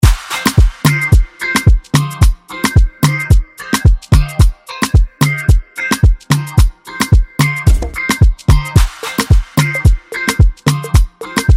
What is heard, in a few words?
Afrogroove